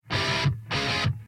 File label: guitar gothic heavy